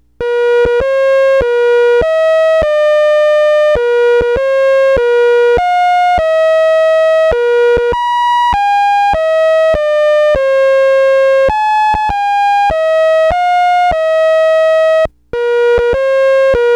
led candle happy birthday, Listen to Light

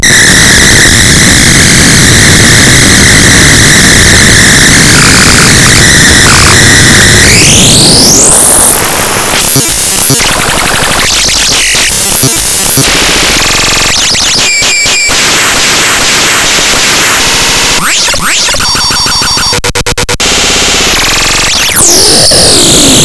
Fighting Vehicle

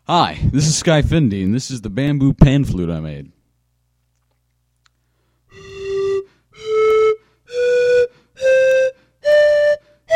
panflute

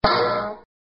collide

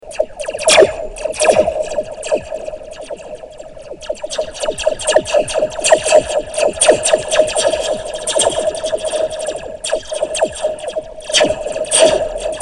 coffee cup slinky sound

